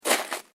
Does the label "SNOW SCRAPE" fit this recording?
Yes